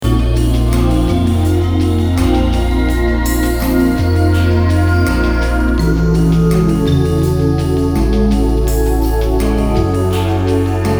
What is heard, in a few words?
GM loops